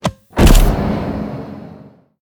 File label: Bombard launch; we are the dwarves gamerip 2016